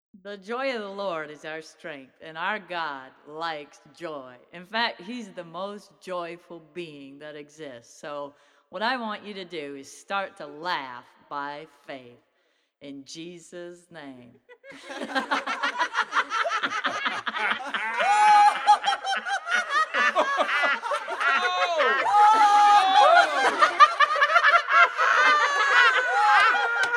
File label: laugh